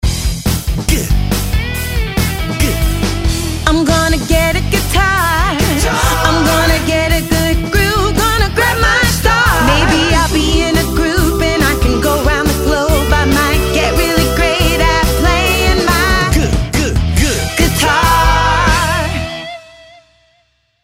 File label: G Song Guitar